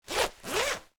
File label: jacket zipper, cloth